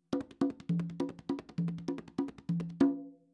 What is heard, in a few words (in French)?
roulement ternaire, congas